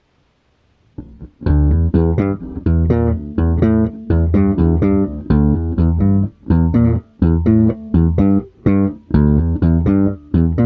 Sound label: bass; blade2